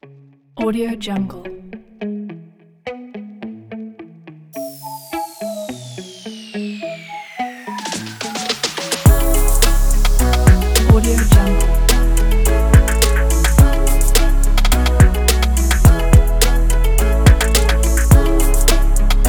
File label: the hip hop